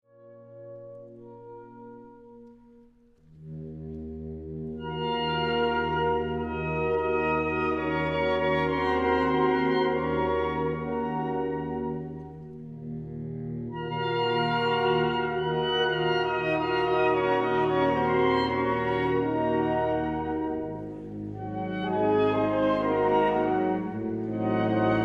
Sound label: Sax Ensemble; Mi Bemol